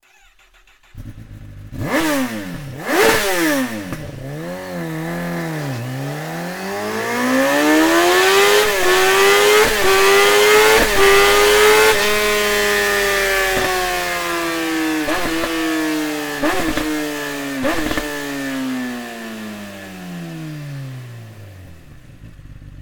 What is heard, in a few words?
slip on exhaust kawasaki zx10 r; akrapovic